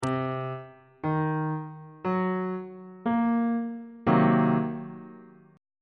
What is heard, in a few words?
d double flat half diminished 7th chord; bass clef